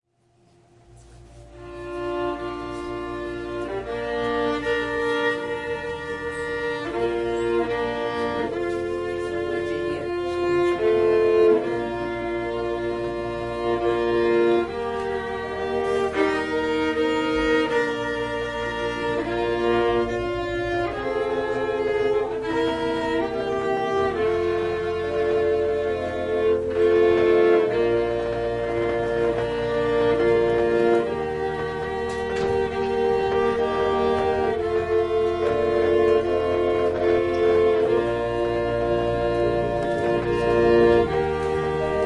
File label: Organ and violin prelude